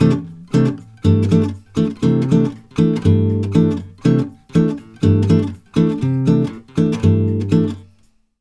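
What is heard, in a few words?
guitar C, Brazil Bossa; USB Soundscan vol.16; AKAI; 90s sample CD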